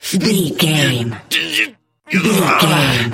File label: voice male effort long